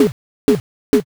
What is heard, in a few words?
hammer